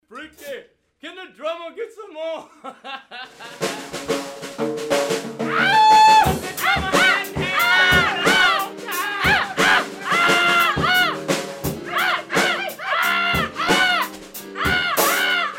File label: scream; scream sensation